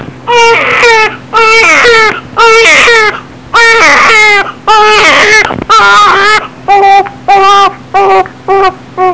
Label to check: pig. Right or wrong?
Wrong